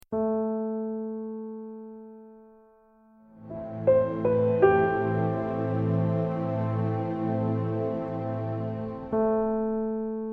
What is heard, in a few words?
cloudy sky 160bpm, Underscore; hartwigmedia